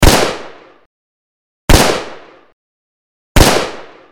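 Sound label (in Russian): zvuk vystrela, gunshot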